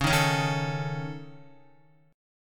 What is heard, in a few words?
C sharp Suspended 2nd Sharp 5th C sharp Guitar; C#sus2#5; strummed; JGuitar